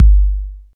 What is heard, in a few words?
DRM R8 808 K, Drum Machine; Roland L-CDX-01; Cheese